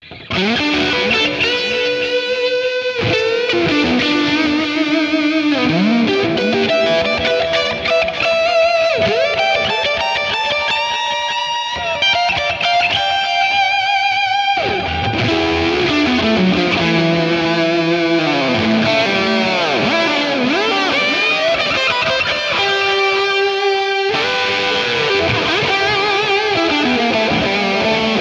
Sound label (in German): Gitarren Plug-ins im Vergleich Logic Amp Designer Highgain Lead